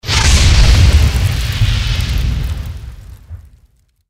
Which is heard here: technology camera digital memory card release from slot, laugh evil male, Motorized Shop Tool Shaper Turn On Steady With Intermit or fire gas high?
fire gas high